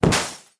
Landing Hit; Pajamas; smite; kumbhakarna

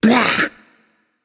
"ugh"; babayaga

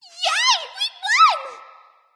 nv yay; smite; awilix

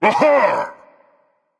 yay berserker; cuchulainn

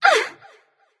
grunt hit light; smite; voicepack; hel

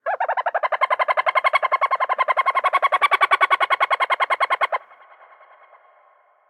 ambience good bird; smite; conquest

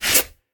scuff; smite; gilgamesh

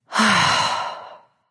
nv sigh; smite; awilix

